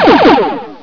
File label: WDK Double Laz; The War Engine